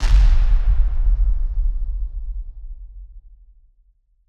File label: Hit Splosion; musicradar; bomb factory